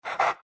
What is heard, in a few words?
pant small, greevil; courier